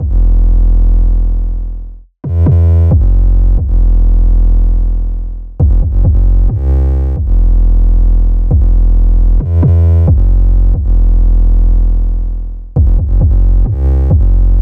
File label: drill 808 with kick